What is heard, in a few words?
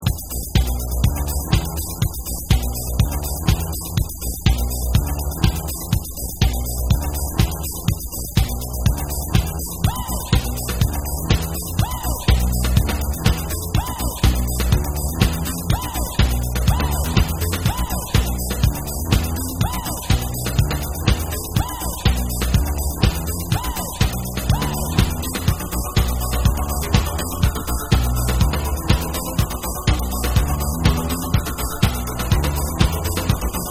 TRANCE